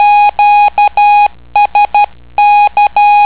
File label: qsk